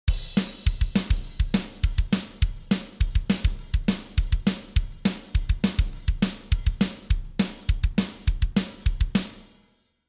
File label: drum off